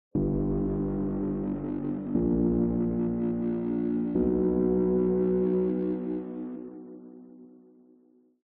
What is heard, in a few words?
MUSICAL HORROR Graveyard; smartsound